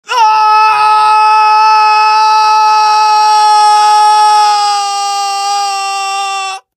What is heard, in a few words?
falling; scout; source engine; tf